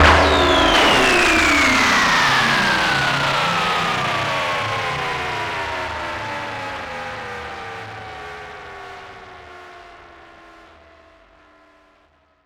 fx the end; One Shot FX; Sample Magic; Transmission-X

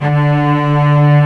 ARCO CELLI; E-MU Formula 4000 Series Vol. 5; Protozoa; Proteus2 Presets

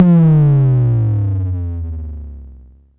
BASS MOD, Dual Percussion Synthesiser; Klone

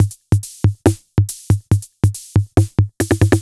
Tape 140 bpm, retro drum machine samples, Loops; MT40

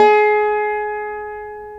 VEENA G#5; Spectrasonics; Heart of Asia

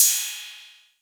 Roland 808 CY

